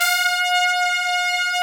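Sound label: trumpets section; Roland L-CDX-03 Disk 2; BRS Tps Sec.1 f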